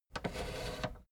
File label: fader plastic scrape single down halfway slow